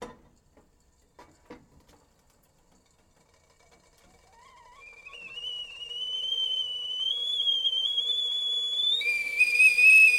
Steam, Kettle, Whistle, Surge, Strong, Steady, Lift Off Burner, Diminish, setting down on burner; D50 - setting down on burner at head